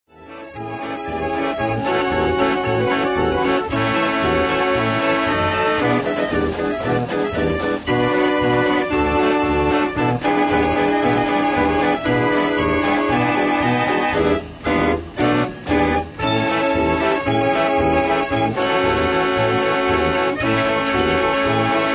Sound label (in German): Kirmesorgel